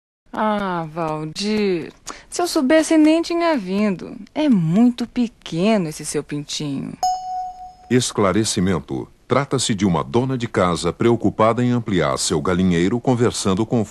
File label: jingle; semanaradio